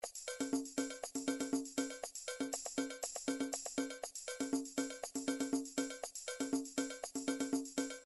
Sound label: drums mambo, midi drum patterns